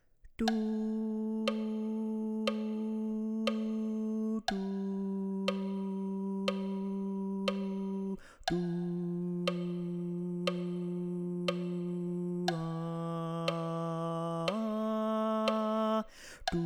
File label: Tenor